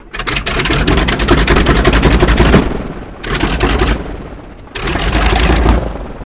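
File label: Plane Engine Start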